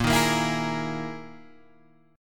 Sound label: E Major 7th Flat 5th chord